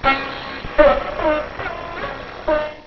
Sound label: sitar